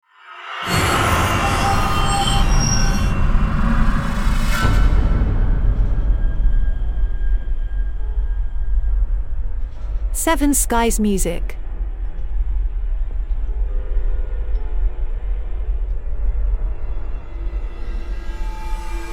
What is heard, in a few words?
Scary sting